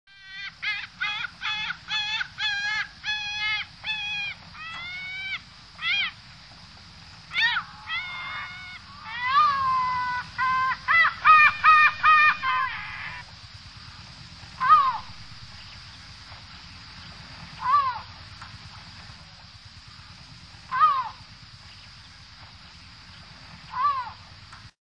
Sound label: Larus hyperboreus; Sakhalin; Kuril; sea; priroda sakhalina i kuril